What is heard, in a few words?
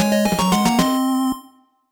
Win (Battle Mode)